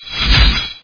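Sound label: sound of sharpening a huge sword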